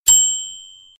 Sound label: Microwave Bell Sms